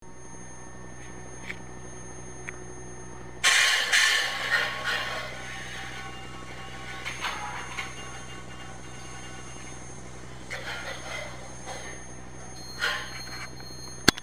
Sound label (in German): Licht wird angemacht